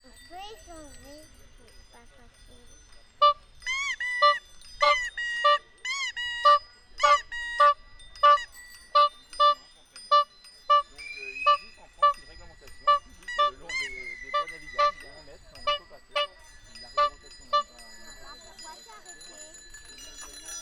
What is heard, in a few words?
picnic berges